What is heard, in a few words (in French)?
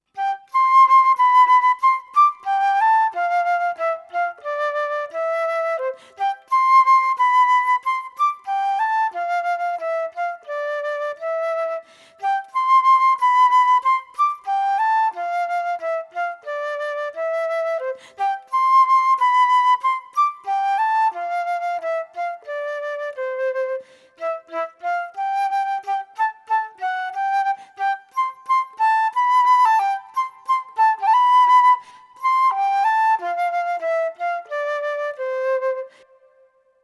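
Flûte traversière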